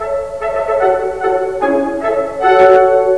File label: trumpets